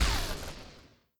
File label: bomb launching